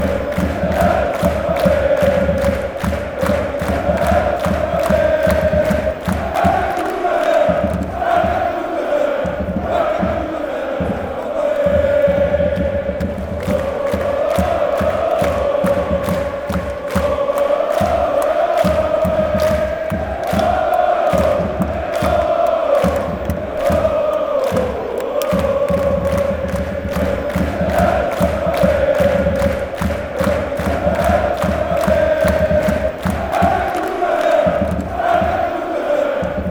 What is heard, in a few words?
oh woahhh, fan chants